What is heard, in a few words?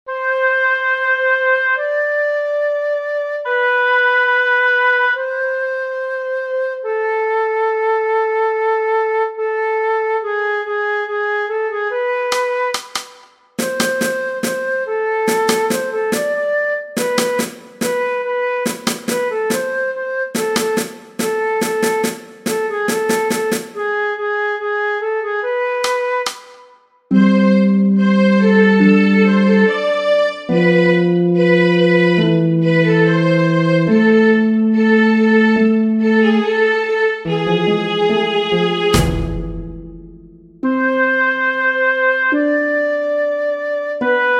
Classical Musings